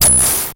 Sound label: Multimedia Internet CD-Rom Flash Radio Element